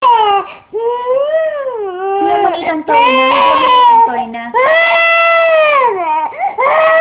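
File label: baby hungry, female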